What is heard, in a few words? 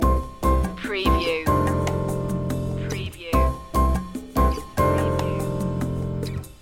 love bossa loop